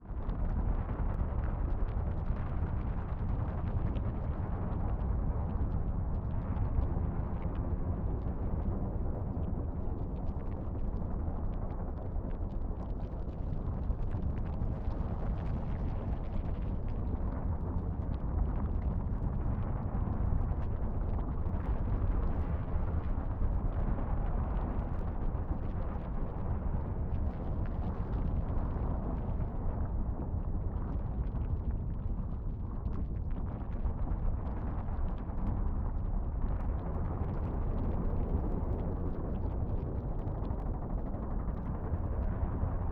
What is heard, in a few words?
Rumbling Soundscape